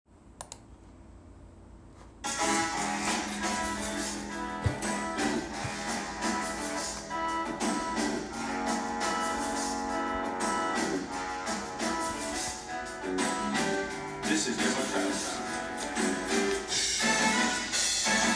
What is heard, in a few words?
Melody + Bass; Fame The Musical - Holiday Pack